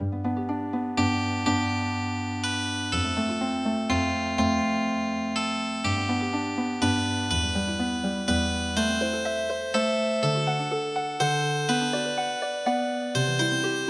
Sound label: Healing Harp, traditional